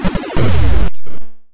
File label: Charged Sonic Boom Attack 8-Bit